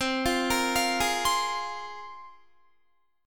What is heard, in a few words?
C 7th Suspended 4th Sharp 5th, Guitar; C7sus4#5 arpeggiated